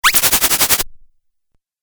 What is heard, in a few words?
Laser Hiss Video Game